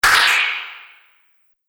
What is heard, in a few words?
Bright Abstract Hit With Echo; Miscellaneous Impact Sound Effects; Andrew Fleming